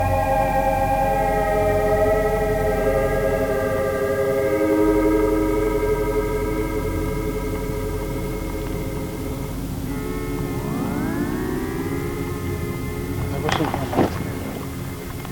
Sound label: waking up; fluttering my morning eyes